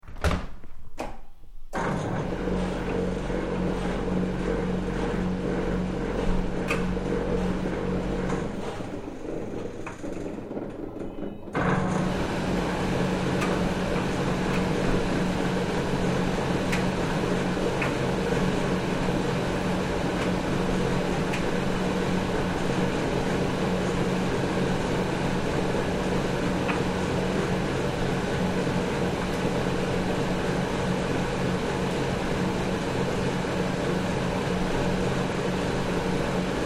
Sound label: Tumble Dryer Start Run And Stop, Door moves; Appliances; Household